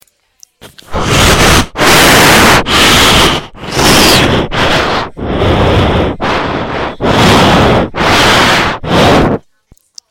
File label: breathing